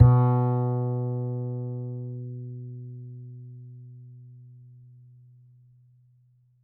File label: D string upright bass; Upright Acoustic Bass